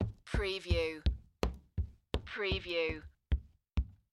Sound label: Footstep Stairs Wood